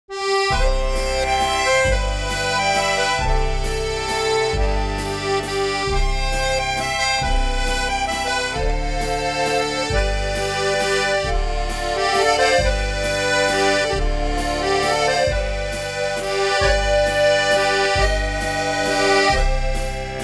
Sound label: Retro; American McGee Presents Bad Day LA